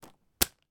sport swimming goggles elastic stretch and release